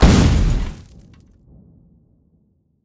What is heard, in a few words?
small explosion; factorio 2.0.55